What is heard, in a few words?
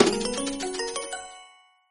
jingle win hand low